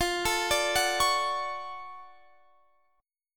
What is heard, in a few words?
D-Major 7th F